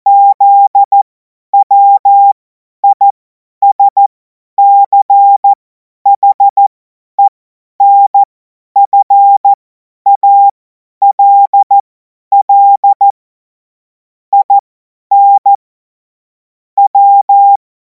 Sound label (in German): Witz, Morsekurs, cw morsen; Tempo 50 F70; hamradio; cw witze